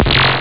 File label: bzz